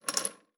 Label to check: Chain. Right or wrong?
Right